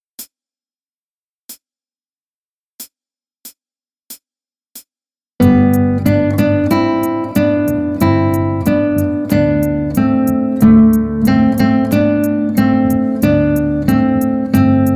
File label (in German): Hexen-Einmaleins, Guitar; Play Guitar Junior mit Schildi